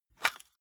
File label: cassette case open hard; objekte; kassette